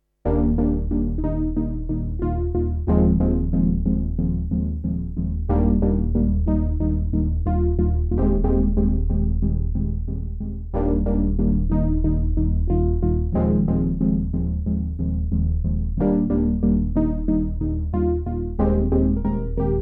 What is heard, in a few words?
maths saw